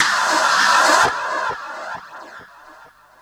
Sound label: SCRA; XP RECORD-FX; Best Service; XXL Production Effects